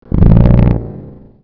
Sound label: pipe